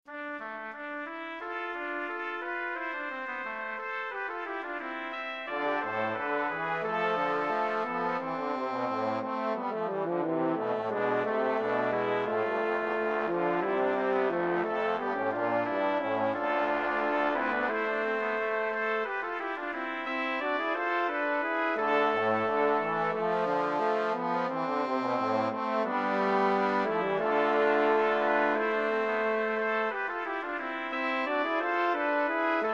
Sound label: Brass Quintet; Canzon Per Sonare La Spritua